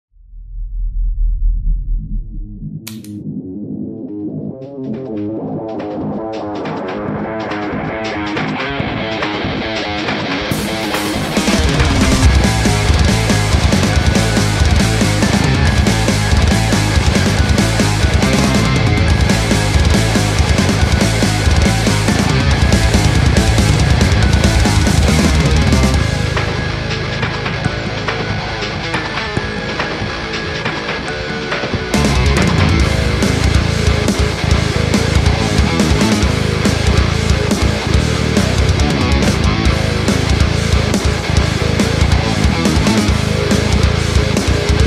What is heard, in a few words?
chopper z, guitar distortion pedal; guitar effect pedal; joyo r 18